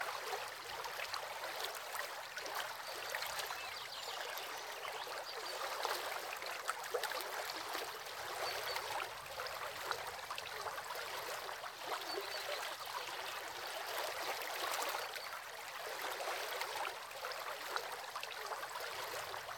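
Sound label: Scottish inn exterior bridge morning; the 39 steps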